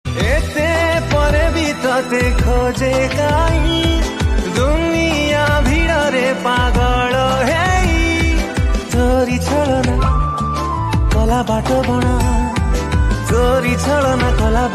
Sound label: Odia Ringtone